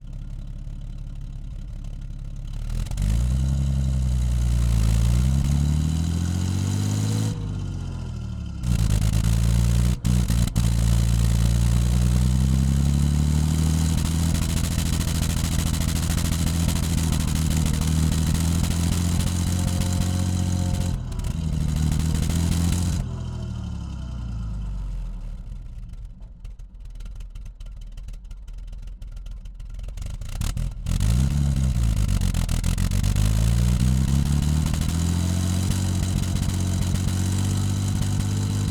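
Centurion Tank Onboard Medium Drive Stop Exhaust Right; Mk V2 1955; DPA4062; Pole Position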